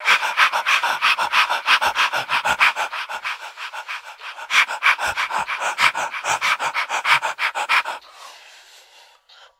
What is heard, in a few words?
breathing fast; LOOPED; Voice; ooh ahh